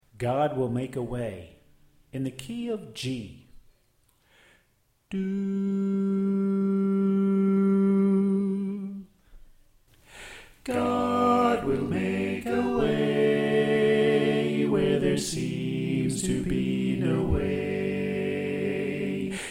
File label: Soprano Left